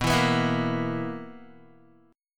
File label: B Major 7th Suspended 4th B down Guitar; strummed